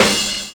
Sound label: CRASHING; Univers Sons